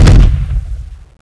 dash end

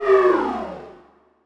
mine timeout, sound/weapons

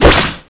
magic knife slash

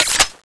plasma clip out, sound/weapons

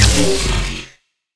shoot end, weapons

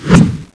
summon slash, weapons

